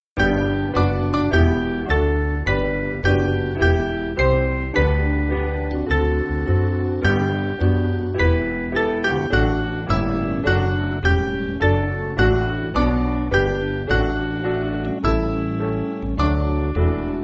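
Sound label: Piano, Music Backing; Mainly Piano (CM)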